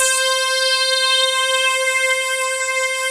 FNK TOUCH C5, CLAVI FUN, Synth

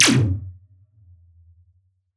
weeee

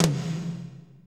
TOM; Northstar - Drumscapes Roland

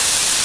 Piano Version; Otogirisou

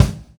Atria Kick; Sonic Foundry (Sony Creative Software); Drums & Drones; Construction Kits

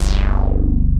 BIG BTM BS; Pro-Rec - Synthsonic

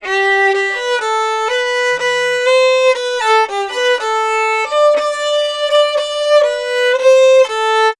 Fiddle, Appalachian

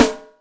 Binky, Yamaha DTXpress Acoustic Snare

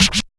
Scratch; Drums In Your FACE!

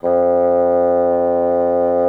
WND BSN, Bassoon

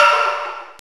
AMBIA JAR, METAL & WOOD; Roland - LA Composer vol. 1

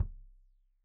Kik Stereo; Deeply Sampled Drums

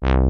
RESONATOR, MINIMOOG

